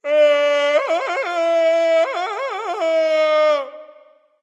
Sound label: fear, voice; Smite; ymir skin12